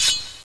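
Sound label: knife draw, weapons; quakerepo fortress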